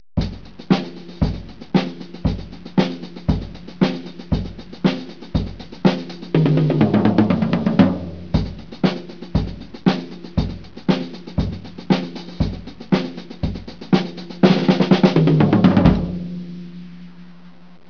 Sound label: drum; 8bit